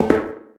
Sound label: pipe step; Golden Crayon Sounds